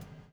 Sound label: BUBL, Backbeat; Spectrasonics - Backbeat